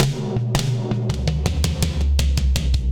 Fill Ambient Loud Punch 82bpm, Session Drums Studio; Tomzlla; Ableton; Factory Packs